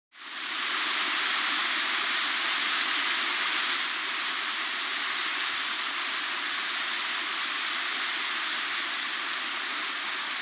Wedge-Billed Hummingbird; Schistes G; S. America

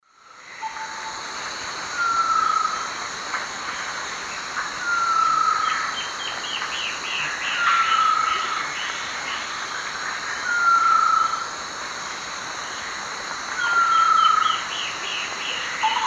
Grallaria dignissima, song-like call; S. America; Eastern Lowlands

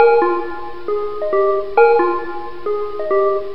Synth, Techno

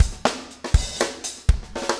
4 on floor 120, loops, 120bpm

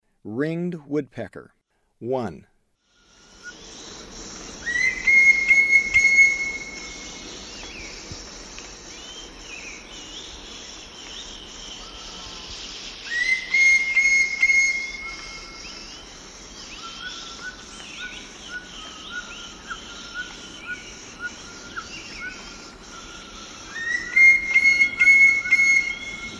Ringed Woodpecker; S. America; Eastern Lowlands

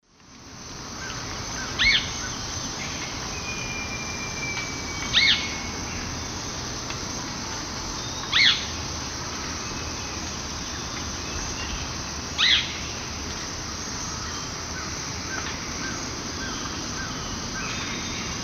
Hypocnemis hypoxantha, call male; S. America; Eastern Lowlands

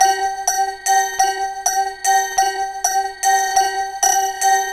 Goat Bell; Electronica; Metal

